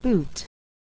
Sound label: boot